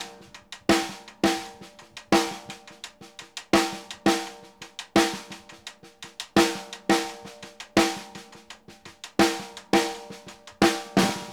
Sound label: RIM + SNARE, 84; Sampleheads; New York City Drumworks VOL-1; SP ORLNS 84